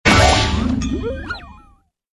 toon Hit; toontown-ceiling

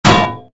drop anvil miss

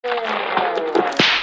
land; Toontown Online

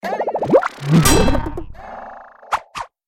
silly Meter Phase One

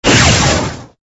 lightning; Toontown Online

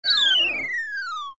lose shrinking

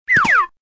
jump to side

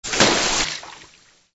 watercooler spray only; Toontown Online

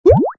ring get; toontown-classic

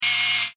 Photo zoom

